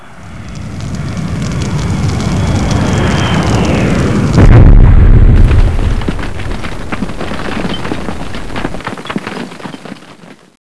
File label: meteor; XMEN2